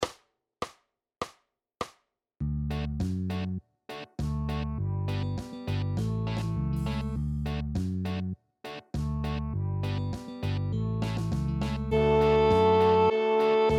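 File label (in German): reaggy für Geige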